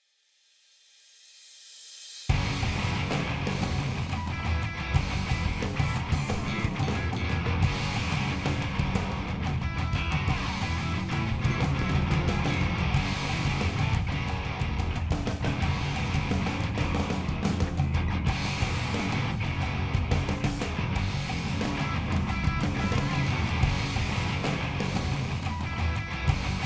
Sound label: BGM Warming Up; Phase2